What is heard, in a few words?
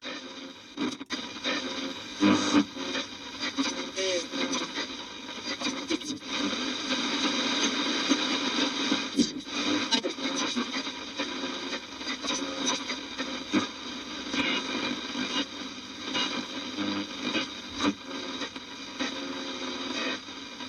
radio static; Blind Drive